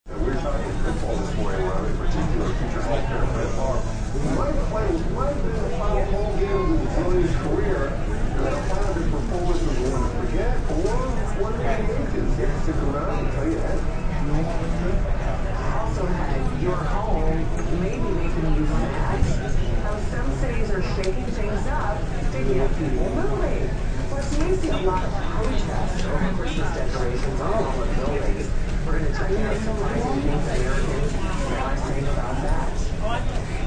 Background sounds and chatter while waiting for plane.; Airport Lounge; Airports